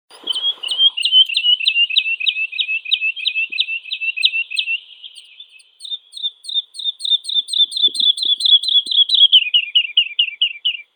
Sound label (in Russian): zhavoronok lesnoi lullula arborea, zvuki prirodi